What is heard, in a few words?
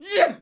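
Cbmn Attack Vox; Civ4 warlords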